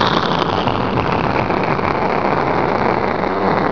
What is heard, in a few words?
ENGINE